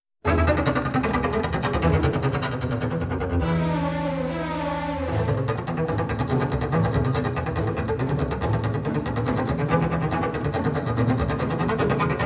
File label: Bass Orchestra; Nikolai Rimsky Korsakov; Flight of the Bumble Bee